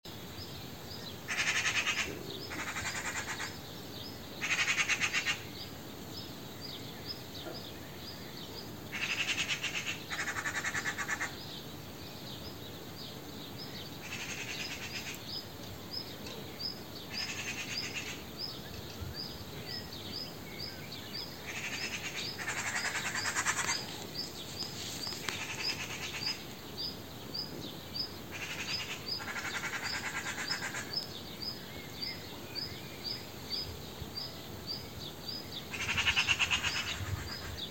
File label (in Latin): Pica pica; SETE